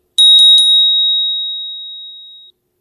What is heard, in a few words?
Dingdingding